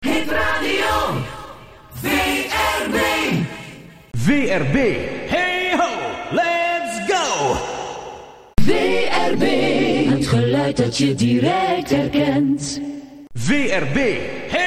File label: jingles